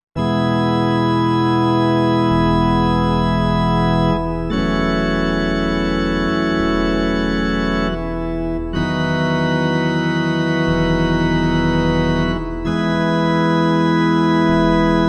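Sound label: Organ Cathedral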